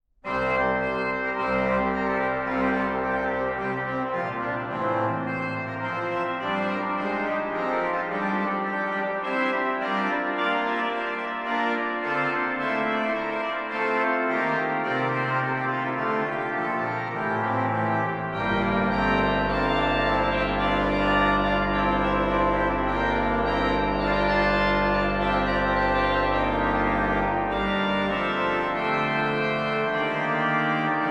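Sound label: tambourin, organ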